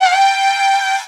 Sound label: vocal pink G; SD AURORA Sample Pack; Slate